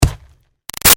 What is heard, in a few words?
Snow Impact Thud Low; Weather